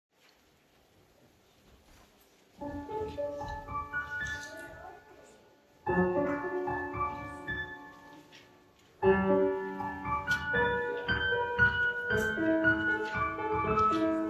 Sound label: Prelude